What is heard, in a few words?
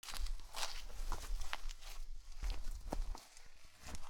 animal digging dirt; sonniss gdc